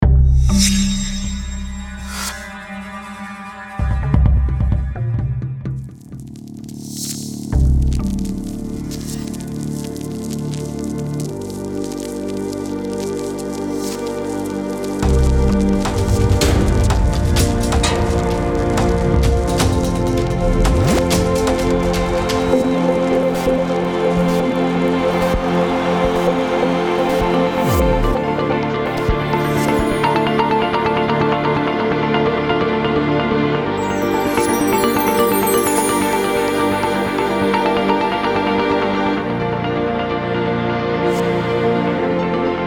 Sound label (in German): imagefilm